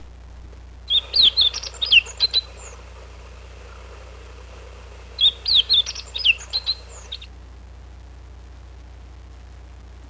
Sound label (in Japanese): hojiro